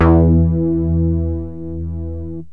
mg bass, yamaha rm1x synth bass and lead

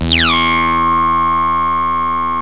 bl dist, yamaha rm1x synth bass and lead

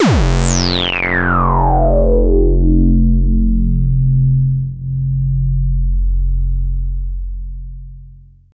Synth Bass; Basses

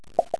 land

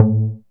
MOOG BASS; SoundFactory

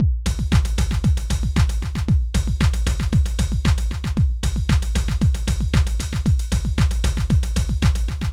Dance Drumloop; Drums; Dance Beats

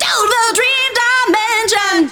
VOX, VOCALS; SoundFactory; SMPMANIA

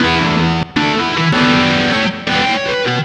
Organ

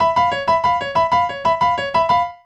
Acoustic Piano

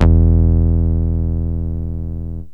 oct bass, yamaha rm1x synth

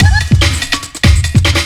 LOOP; SoundFactory; DRUMLOOP

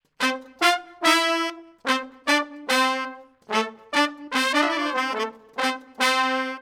Horns Section

